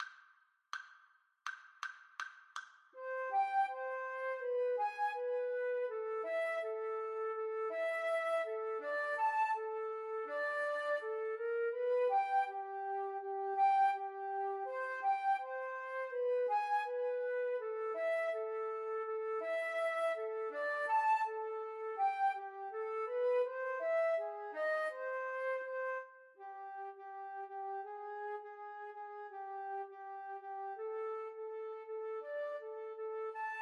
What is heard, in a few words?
rag, Flute duet